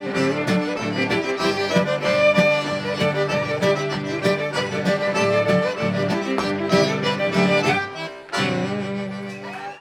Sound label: creole stomp; loc-musicbox